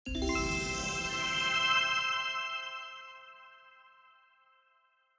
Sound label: Level Up